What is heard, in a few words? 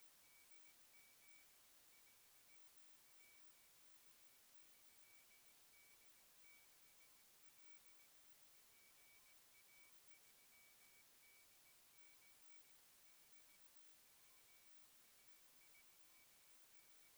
28040kHz RF; SK6OSO